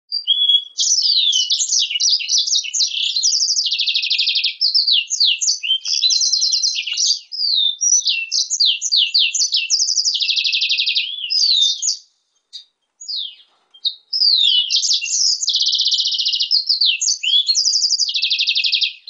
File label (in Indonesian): hibrid blackthroat x kenari